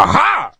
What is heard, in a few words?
jump; quake2; strongbad